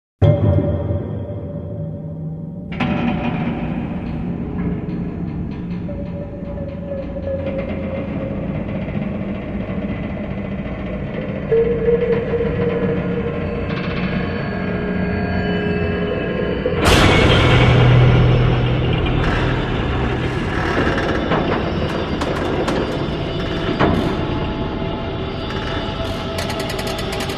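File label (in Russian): Страшные звуки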